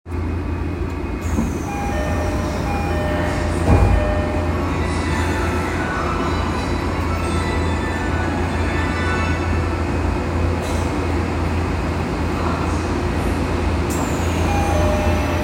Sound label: train sounds; 有楽町線東池袋東